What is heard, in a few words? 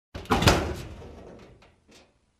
Big Compartment close